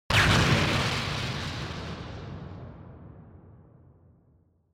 Explosion Long

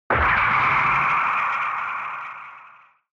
Retro Anime Accent High Long

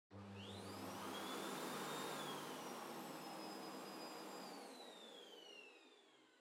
Vacuum Cleaner Far Vacuum Floor Soft Low Still

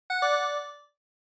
Quiz Ding Dong Single

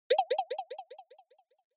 Percussive Accent Low Delay

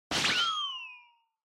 Motion Blow Away Short

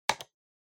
PC Mouse L Hard

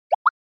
Anime Motion Low

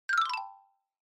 Xylophone, Fast Short Down

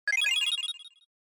SNES RPG Magic Cure

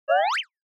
Motion Pop

